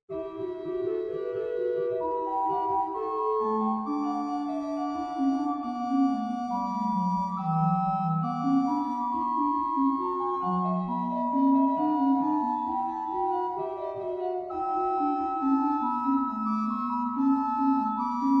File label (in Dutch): improvisatie psalm 5; krewerd